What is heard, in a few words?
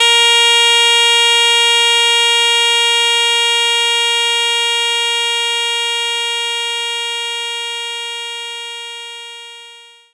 Shenai C3